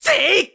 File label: Throw Heavy; Link throwing a heavy object; Skyward Sword; zelda